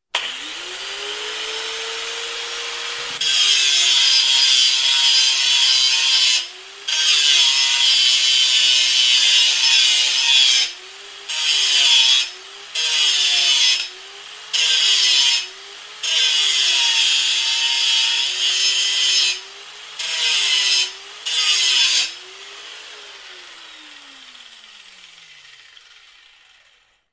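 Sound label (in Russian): болгарка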